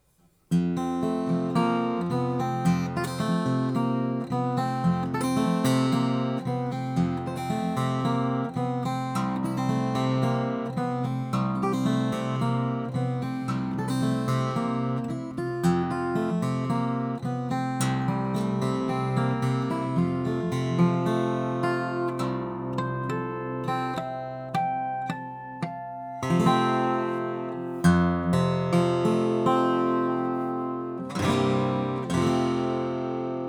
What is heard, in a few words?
ksm141 echo